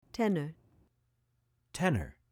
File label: Tenor